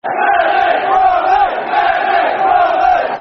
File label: Smethwick (Brummies), Football Chants; West Bromwich Albion Wba; fanchants